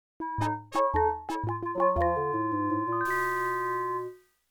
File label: Classic L-Click; Day of the Tentacle Remastered